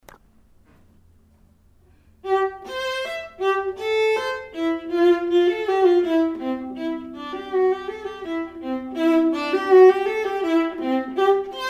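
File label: German Dance C Beethoven, viola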